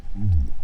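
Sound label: Downsweep, fish sounds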